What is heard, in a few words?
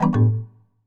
device disconnect; soundscheme for windows